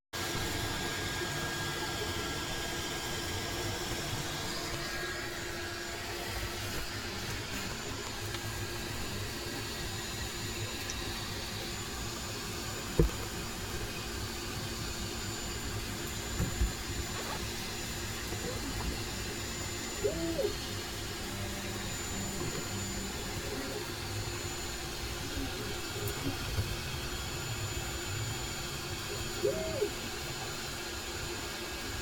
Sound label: 3d printer